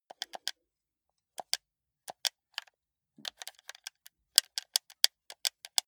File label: console controller play sequence normal